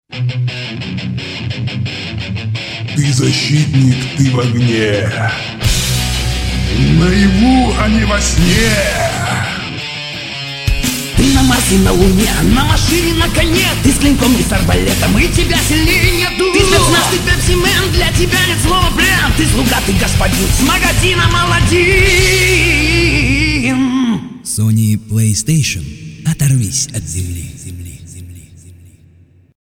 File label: song; aladdin